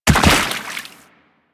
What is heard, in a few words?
Land Water, Footsteps; Enemies; Warframe; Teshin; DE